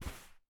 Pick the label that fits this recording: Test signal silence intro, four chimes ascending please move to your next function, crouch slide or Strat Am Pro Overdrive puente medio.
crouch slide